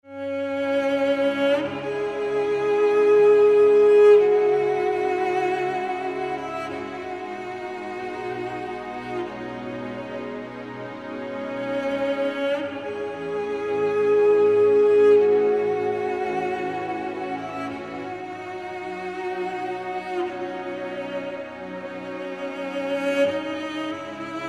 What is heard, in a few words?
CELLO THEME